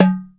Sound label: TABLA DUM; AKAI S6000 CD-ROM - Volume 1; PERCUSSION